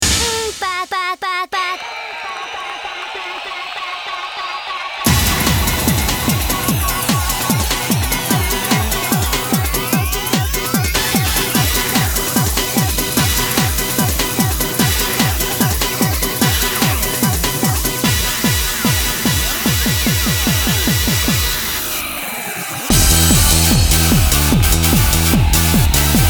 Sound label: Eurobeat; Animation Eurobeat Series Parapara Max 4; Yume no Crayon Oukoku